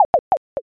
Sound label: dice